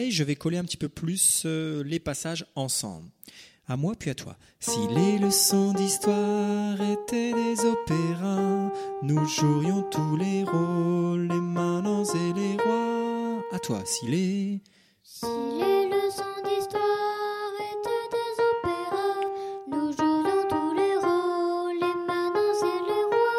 hop hop opéra apprentissage vers répété plus rapide

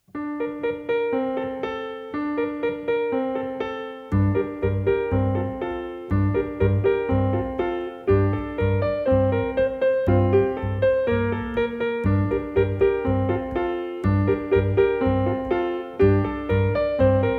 la nature instrumental

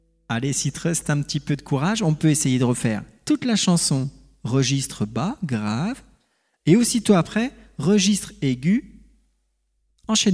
chant final

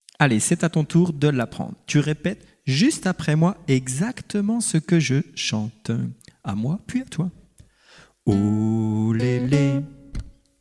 Apprentissage chant

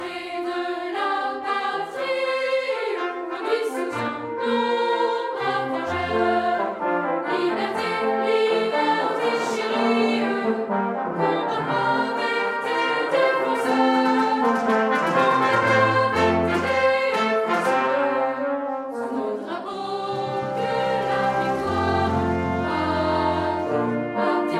Chant Marseillaise chantée

